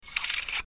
Rollover Spring Extract; Mouseover Digital Warp Warble; Multimedia; Blastwave

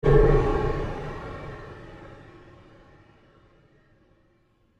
pulse, Various sweeps, pulses and drones; Rise And Dive; Rises and Dives; Sound Design

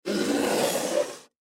Container Drag On Cement; Dragging; Foley

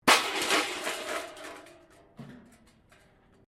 metal rubbish bin lid smash concrete

